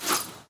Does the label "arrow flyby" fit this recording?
Yes